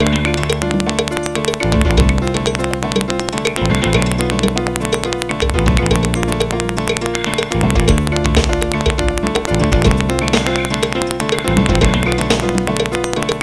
My Life Song, Latin; Sound Factory

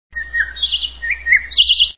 Default Beep; Desktop Studio 2000; Nature; A Breath of Summer

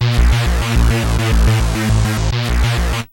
big mod, synths dance rap; The Sample Bank

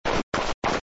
run; JungleReporter

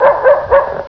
Dog; Mosaic Magic

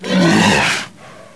monster snarl; Ferazel's Wand Demo

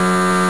DENIED; X-COM; SpaceFlight

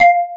Ping; Kitchen Timer

